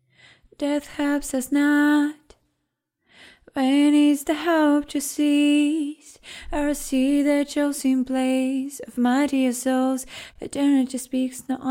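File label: pop, 82, G major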